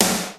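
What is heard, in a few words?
Combo snare drum, Roland JD-990; Drum Samples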